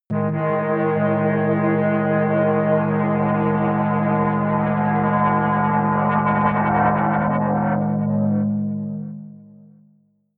atmospheric feedback synthesizer long medium, drones